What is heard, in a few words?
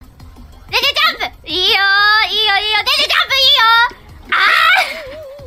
jump